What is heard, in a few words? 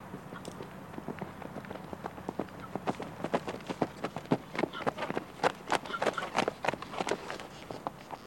Horse Gallop Canter I